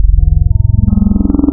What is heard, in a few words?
reso, wavetables, Classic Synths